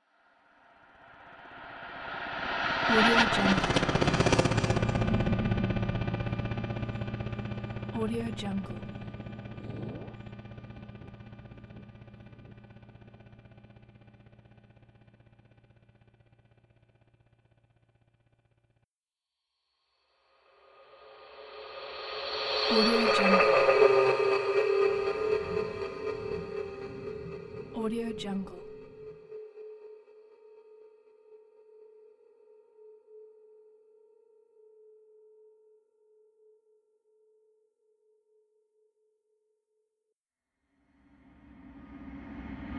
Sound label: scifi suspense ambiance